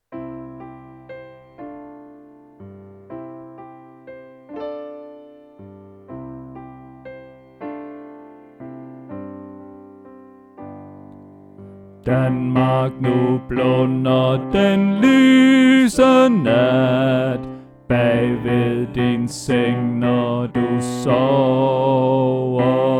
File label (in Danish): Danmark nu blunder Bas; oevefiler